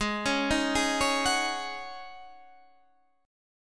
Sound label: Db Suspended 2nd Suspended 4th Ab, chord